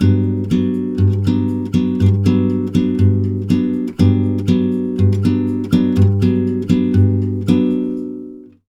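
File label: 120 guitar G, brazil bossa; USB Soundscan; AKAI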